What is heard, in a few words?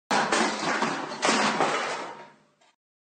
LOUD CRASH SOUND